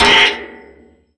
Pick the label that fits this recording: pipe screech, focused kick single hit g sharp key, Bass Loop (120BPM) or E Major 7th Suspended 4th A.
pipe screech